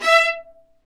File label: String Sections, Violas Marcato